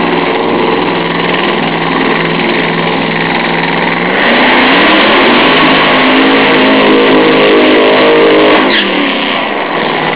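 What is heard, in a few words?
funny car, drag racing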